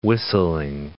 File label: whistling